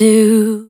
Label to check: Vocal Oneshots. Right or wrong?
Right